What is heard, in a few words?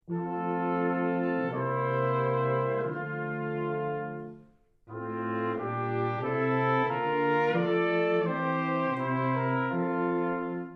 March; March from the Magic Flute; Wolfgang Mozart